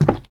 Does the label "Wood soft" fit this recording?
Yes